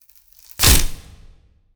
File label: Girder Break; kult